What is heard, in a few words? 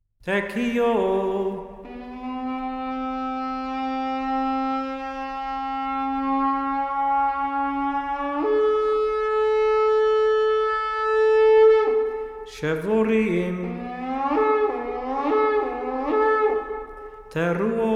Four Shofar Sounds